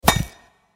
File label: bar stone; New Yankee in King Arthurs Court